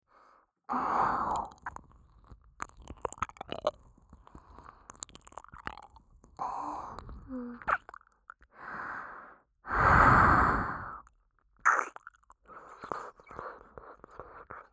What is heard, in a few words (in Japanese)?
右耳舐め, ASMR; アドリブ集